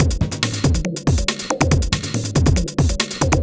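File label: industrial 140 kit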